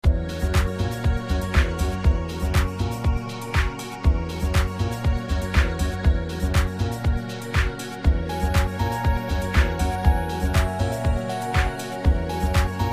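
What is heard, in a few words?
Level Complete Loop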